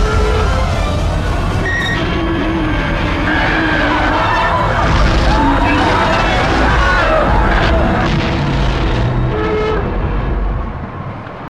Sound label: Roar; Giant Octopus